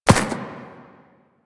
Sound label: Ram Step Walk Grass; Duviri; Animals